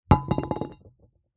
foley bottle glass large empty set down wobble concrete